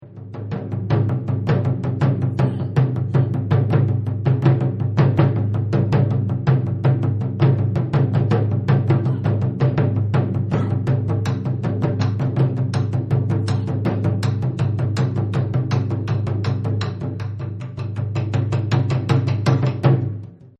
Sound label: hachijo taiko